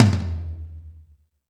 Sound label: Toms, Drum Machines E-MU Pro-Cussion